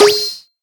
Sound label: arm retraction; HeavenStudio; fillbots